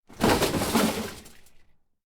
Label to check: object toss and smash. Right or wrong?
Right